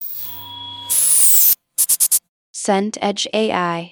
computer process

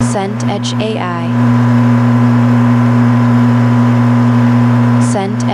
dishwasher run

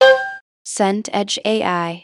violin col legno

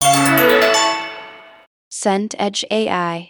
ui achievement big

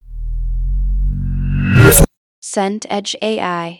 reverse sub

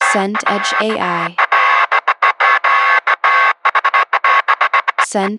bed neon buzz

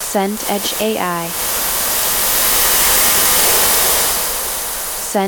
waves rocks crash

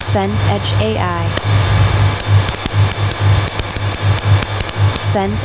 fire bonfire large

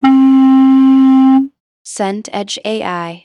clarinet low note

